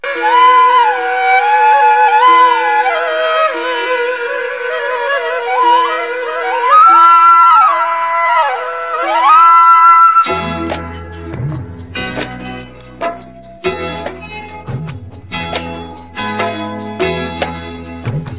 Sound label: Instrumental